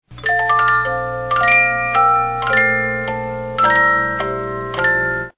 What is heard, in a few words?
Saint Mathews Passion, music box 50-Note